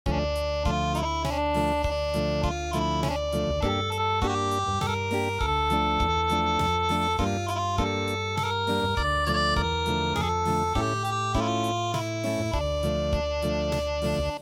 Acoustic

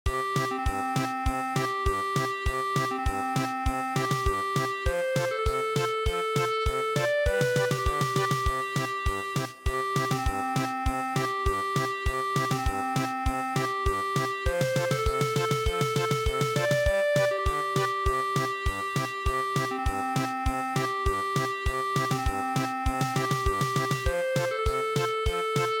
German Polka; English Coast Song

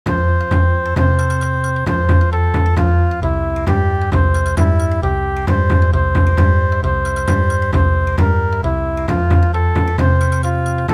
Bon Odori

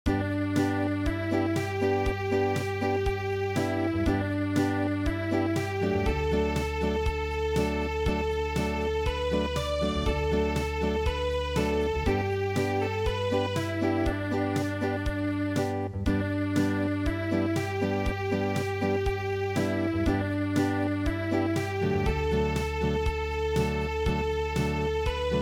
Unplugged, midi; Handsome Molly

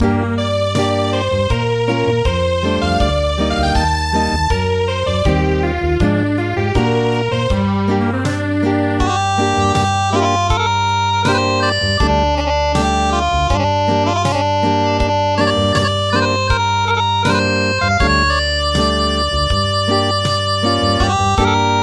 Acoustic

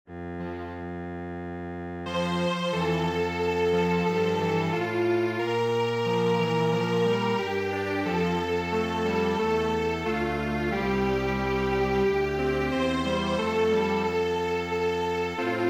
Drum Strings Quartet; midi; traditional

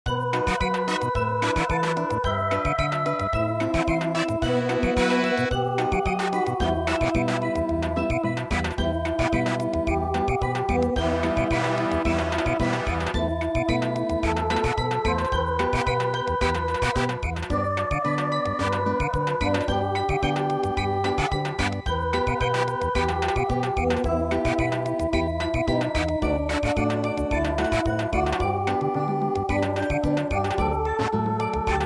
Samba

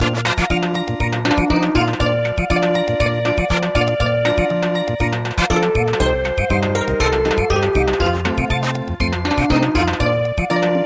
Samba, midi

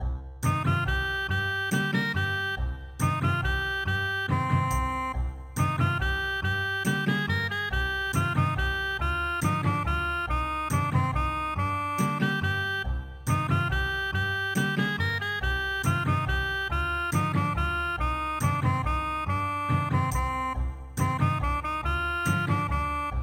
Ethnic Guitar; wheat flower

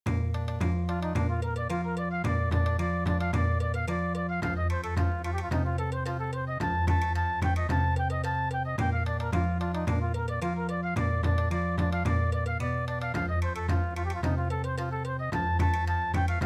Clarinet Polka Bon Odori

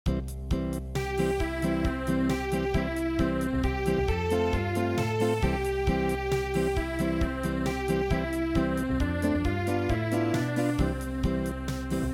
Unplugged, midi

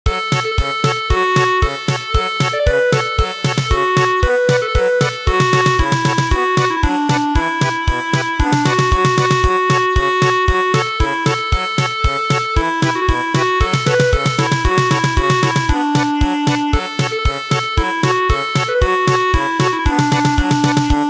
German Polka

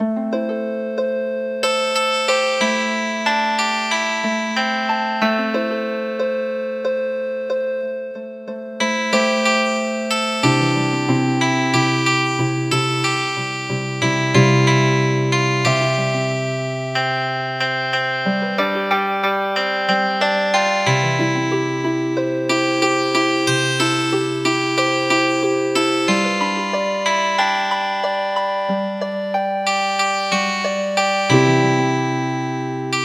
Healin Harp

